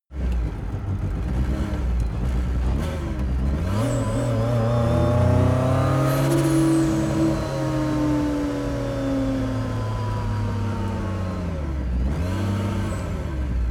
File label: car lancia s4 idle short acceleration long deceleration